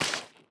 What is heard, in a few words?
step wet grass; Minecraft